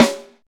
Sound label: Dope Steel Snare Drum C# Key, Royality free snare sound tuned to the C# note